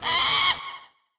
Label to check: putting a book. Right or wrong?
Wrong